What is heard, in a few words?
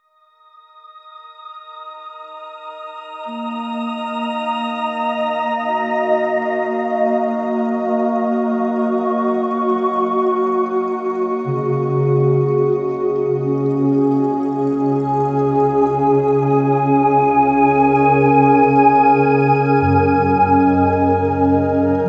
Flute Sparkle Bed; Sonic Foundry (Sony Creative Software); James Johnson; Complete Beds